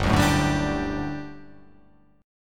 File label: G Major 7th Suspended 2nd G down